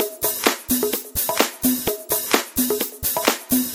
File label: Latin House Loop 128 BPM; Vengeance Samples